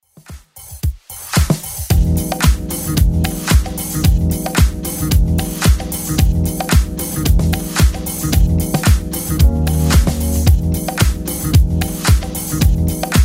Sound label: 80s Club Edit 112bpm